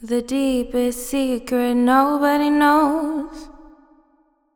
The deepest secret nobody knows, Female Vocals, Wet; Ghosthack Advent Calendar 2017 Day 1; 80PBPM